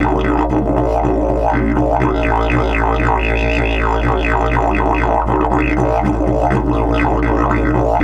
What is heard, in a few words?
120, Didgeridoo